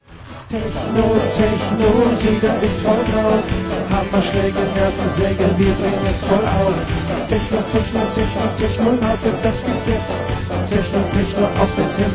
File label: Techno